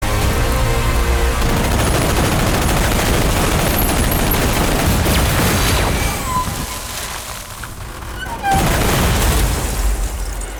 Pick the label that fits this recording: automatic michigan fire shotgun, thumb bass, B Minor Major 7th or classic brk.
automatic michigan fire shotgun